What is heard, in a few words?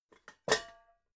pots pick up